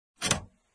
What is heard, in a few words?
open card